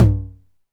Tom Mid 909 Clean

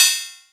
Cymbal

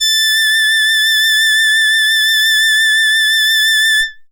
Box Cello DX100 A6

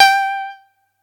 atari 520st G5

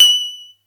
atari 520st

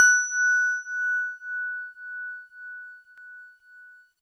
GVibes DX100 Fs6; good vibes

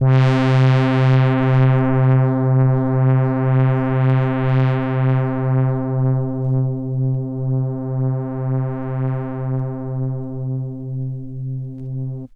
Prodigy Swell Vinyl Synths C2; vinyl keys

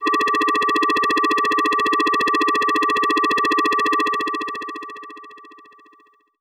Helicopter FX DX100 Fs5

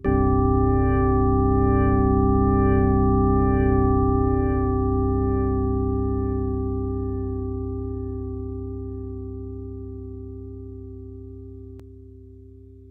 PAD Organ; Professor Malbadors

